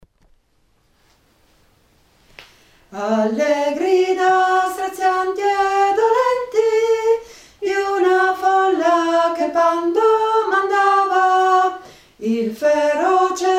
soprano

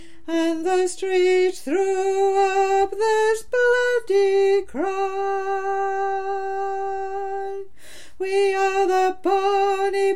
chorus alto

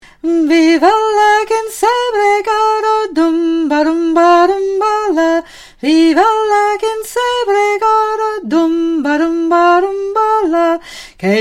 Bass

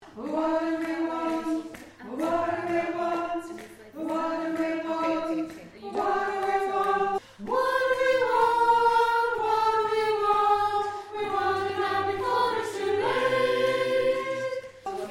what do we want Sop

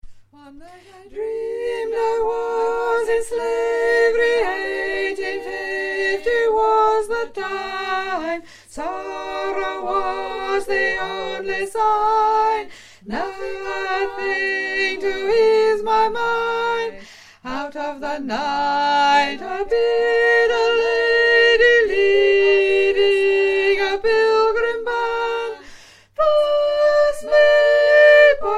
mix tenor; harriet tubman

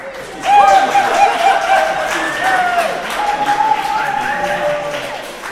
Applause; Transition Town Medley; Greenfair 2016

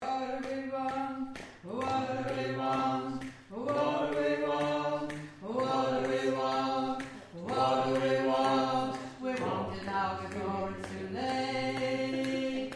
what do we want Bas

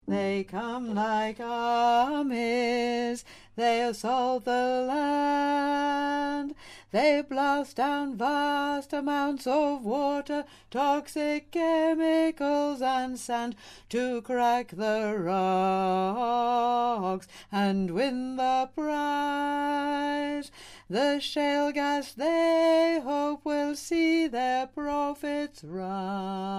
Bass

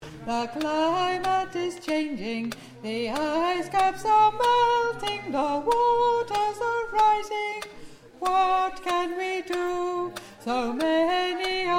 Tenor

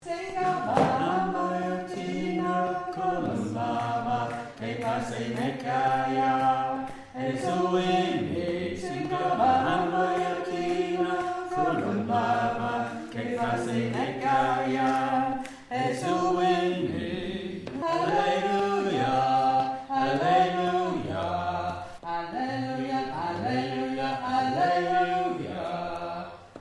Bass

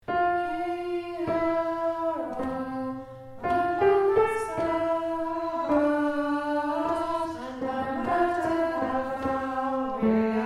Alto

